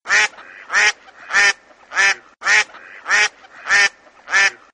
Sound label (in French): canard